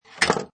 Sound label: Pinball Sound Ball Slides Of Rail And Falls To Floor; Video Games